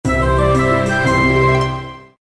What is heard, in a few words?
win large; Jesters Crown